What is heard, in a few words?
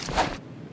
knife slash; Fists; Boxe; CPY JAIL